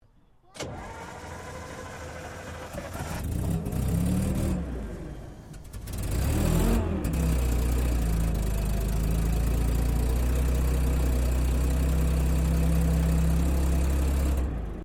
Armored Car Daimler Ferret Ignition Idle, British Ferret Scout Car; Trucks; Vehicles